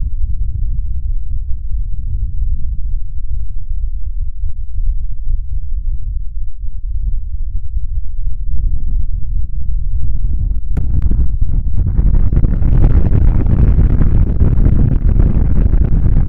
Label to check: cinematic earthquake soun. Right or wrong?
Right